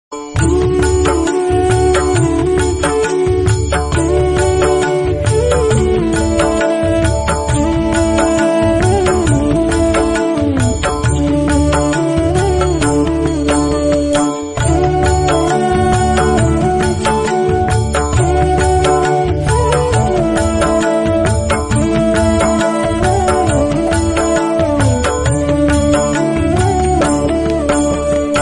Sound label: gayatri mantra flute ringtone